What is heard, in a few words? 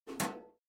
Mailbox close